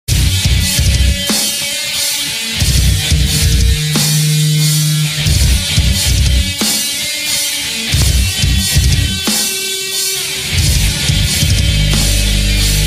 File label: theme hard rock; Terminator